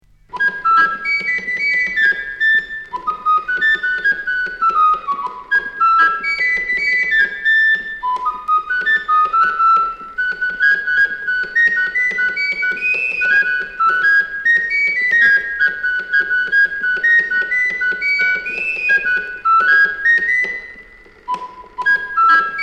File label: mechanical musical